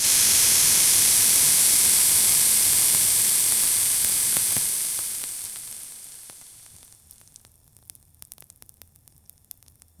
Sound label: violent and intense sizzl, lava